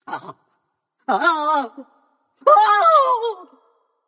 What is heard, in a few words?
fear; smite; janus